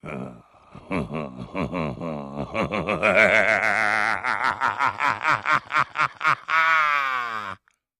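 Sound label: Deep Evil Laugh Male, laughter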